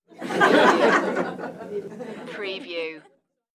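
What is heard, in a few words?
Crowd Laugh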